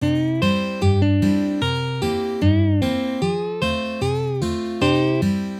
Bend podtyazhka Bend

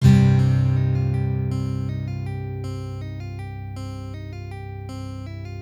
Nota prizrak Ghost Note

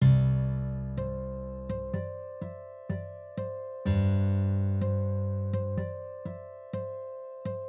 Naturalnyj flazholet Natural Harmonics

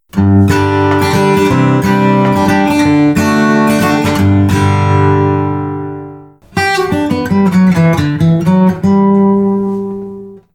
Metal Picks metall

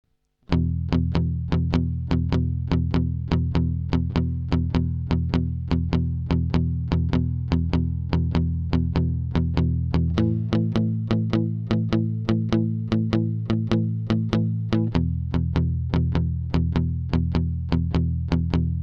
blues prog

